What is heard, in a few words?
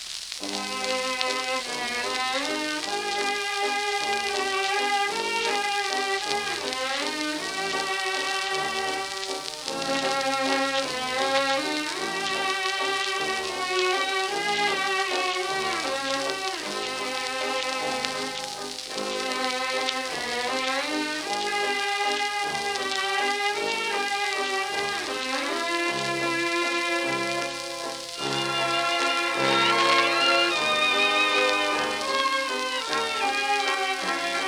Orchestra, 78rpm; VICTOR